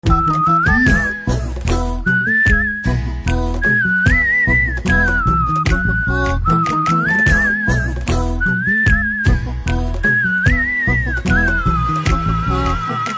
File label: BG Whistle Ringtone; Naadodigal 2; Tamil